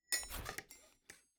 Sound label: block upgrade building